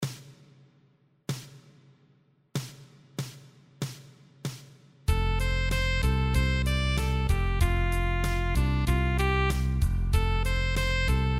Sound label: play along piano